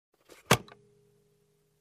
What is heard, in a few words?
Closing compartment